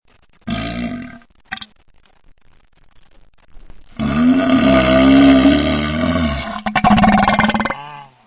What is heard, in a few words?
diarrhea fart